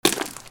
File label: FOOTFALL